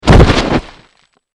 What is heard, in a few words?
dino death impact big